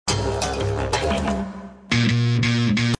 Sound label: loop; jump